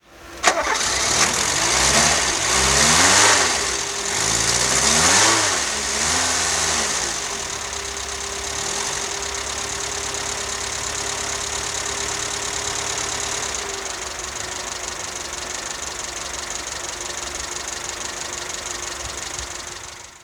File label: R4 Engine, Renault 4